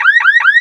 vehicle car alarm loop

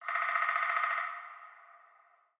ambient winter birds

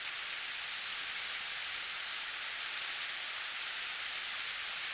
winter trees; World of Tanks Blitz; ambient medvedkovo

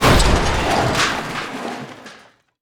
objects, World of Tanks

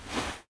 Inf Weapons Foley; Red Orchestra Ostfront 41-45

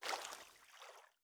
footstep water light; Rust

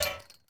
ui drop chainlink; Rust

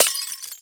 glass window pane piece break; Explosions

